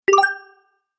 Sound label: Health Boost, video game